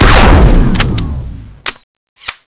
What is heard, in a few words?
railgun, weapons